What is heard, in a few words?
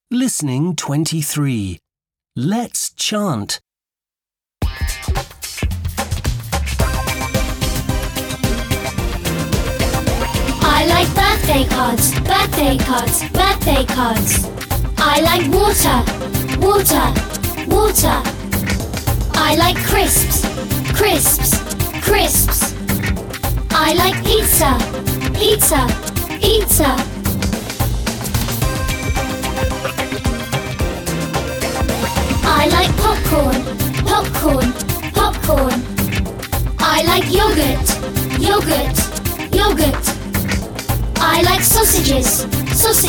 chant